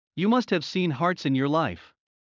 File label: Heart fast; Classroom humanbody